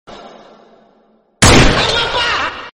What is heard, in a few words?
short gun shot sms tone